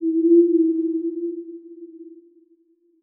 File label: Title Transition Ghostly; Production Element